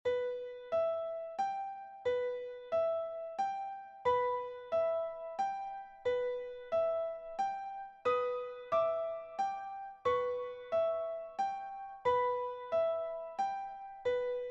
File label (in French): Absence, pour piano solo, Extrait sonore généré sur MuseScore; Durée : env 4min50; Création : Christine Mayencourt, 2005